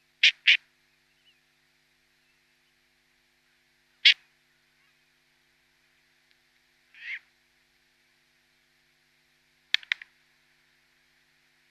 Short-eared Owl downy Young

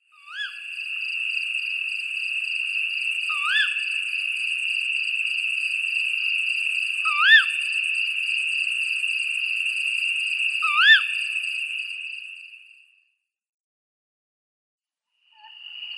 Tawny Owl downy Young

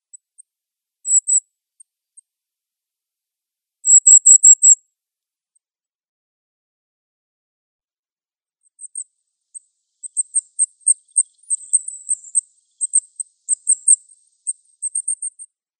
Goldcrest calls

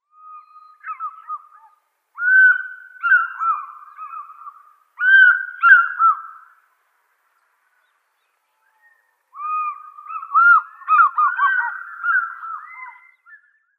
African Fish Eagle

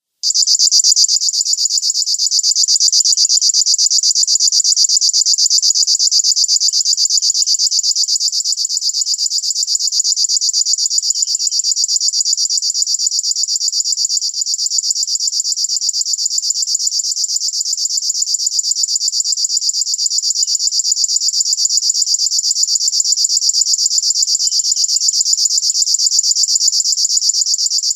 River Warbler song

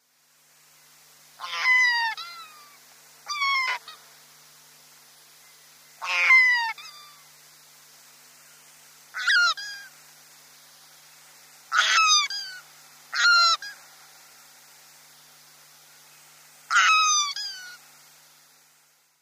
Light-mantled Albatross

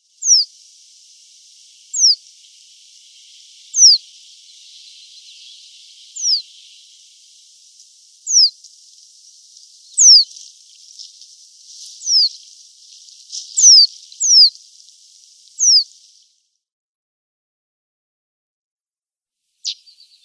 Reed Bunting calls

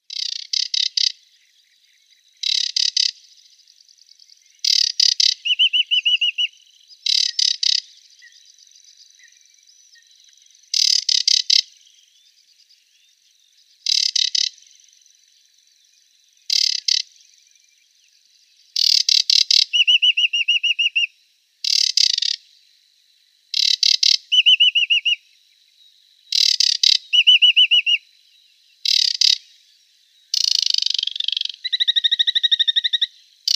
Aquatic Warbler song

